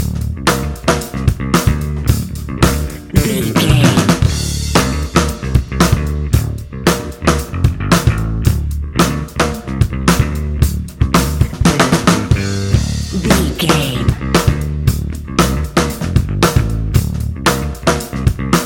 rusty garage rock riot, A rocking music track with rock guitars, heavy rock drums and thumping rock bass lines. Heavy, loud, aggressive